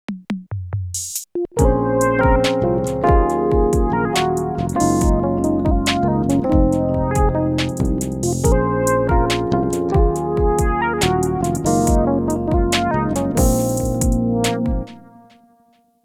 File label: Breath FM8 Square Lead